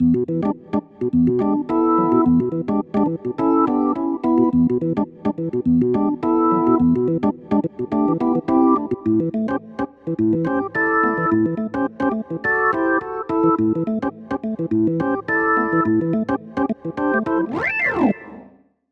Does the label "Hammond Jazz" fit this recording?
Yes